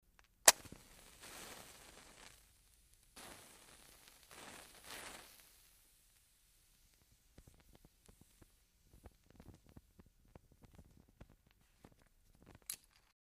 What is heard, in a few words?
Match Strike Small; lighters & matches